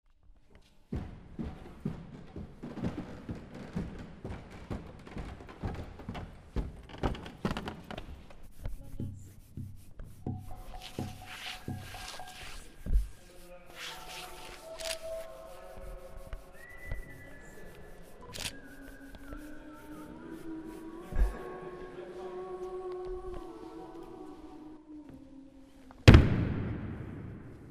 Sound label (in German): Impressionen Eines Raumes